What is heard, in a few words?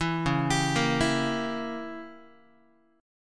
Db Diminished 7th Db down Guitar; arpeggiated; JGuitar